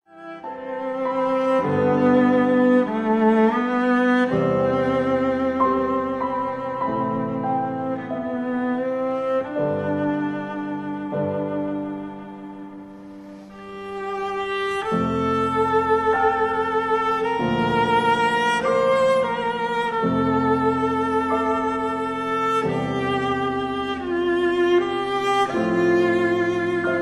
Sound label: Meditations for cello and piano Hymn for Peace